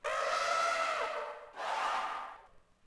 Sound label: elephant; Civ4 warlords